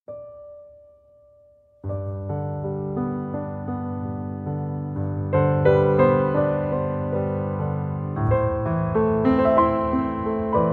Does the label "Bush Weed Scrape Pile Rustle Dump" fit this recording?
No